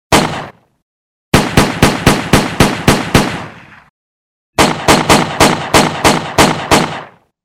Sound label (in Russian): zvuk vystrela